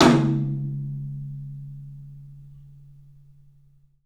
TOM, 3D Drums, CLUB TOM; 3D Sound

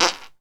fart

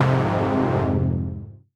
Fm Dub Chord

